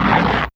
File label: Rip, Fairlight CMI IIe Weather